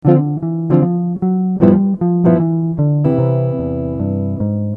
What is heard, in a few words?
chord